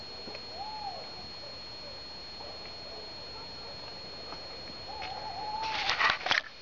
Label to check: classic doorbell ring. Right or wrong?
Wrong